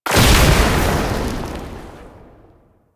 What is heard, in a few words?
Alchemy Grenade Explosion Fire; Warframe; Entrati